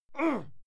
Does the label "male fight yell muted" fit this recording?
Yes